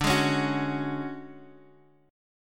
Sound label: D Major 7th Flat 5th, Guitar, Half Step Down